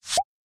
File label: delete; chrome music lab